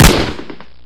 weapon heli; weapons

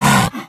pseudodog hurt; monsters

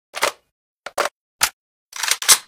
groza reload

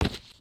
step wood

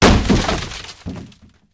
large furniture

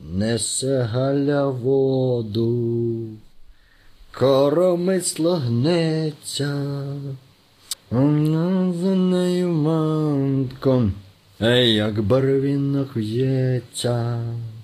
humming, stalker

